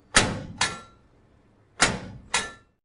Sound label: vending restock done; Machines